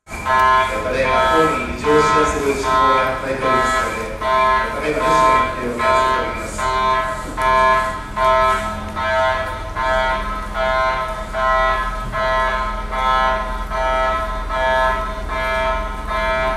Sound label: rail warn; kq kqkamata